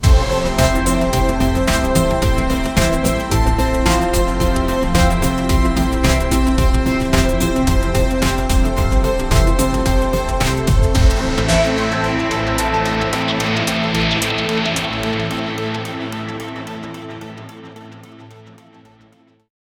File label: logo reveal intro store e; advertisement